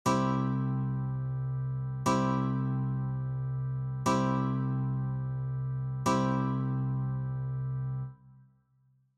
C Chord, guitar; childrens

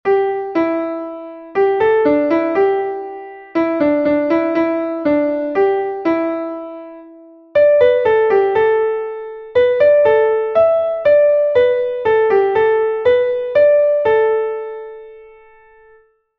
piano

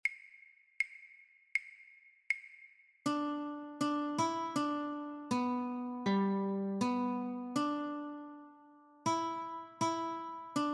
Notes, guitar; christmas

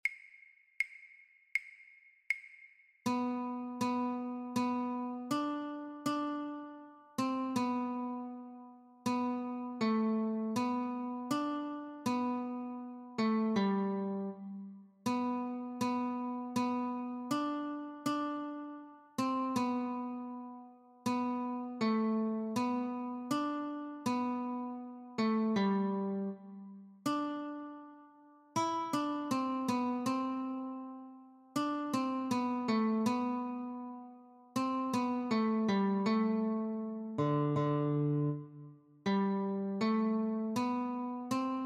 Notes, guitar; Angels We have Heard on High; christmas

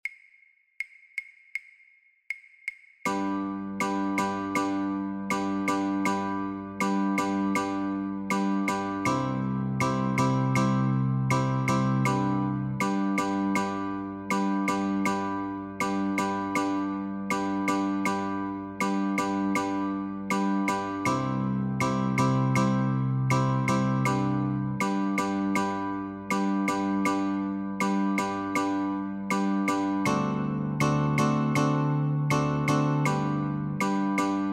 Chords, Guitar; christmas

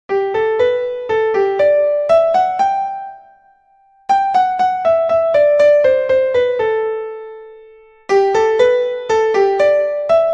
Gavotte, classical piano

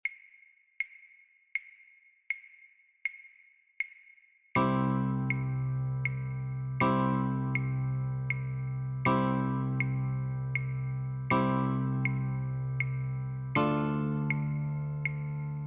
Chords, guitar; christmas

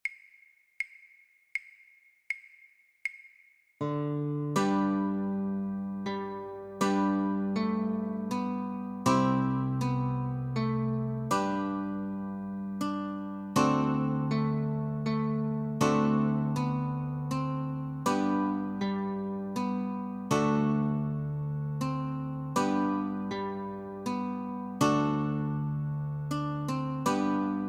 Notes & Chords, guitar; Gloucestershire Wassail; christmas

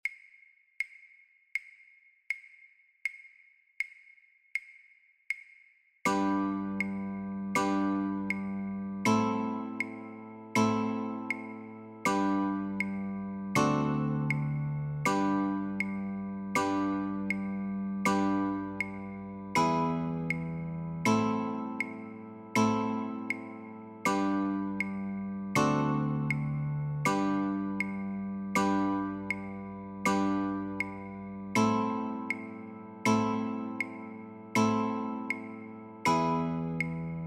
Chords, guitar; christmas

